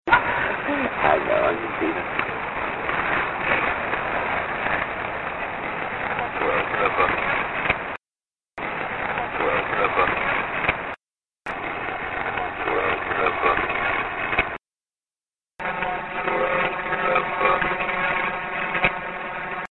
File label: evp cemetery amplified; belton